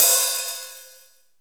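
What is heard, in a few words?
Roland R-8 open hi-hat; Internal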